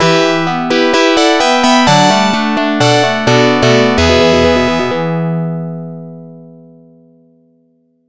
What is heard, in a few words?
game over; arcade; commando